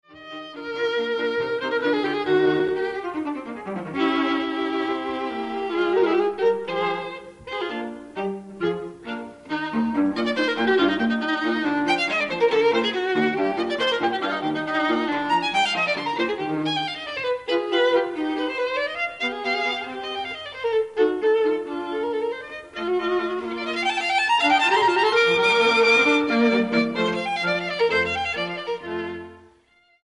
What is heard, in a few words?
Divertimento for String Trio in E-flat Major Allegro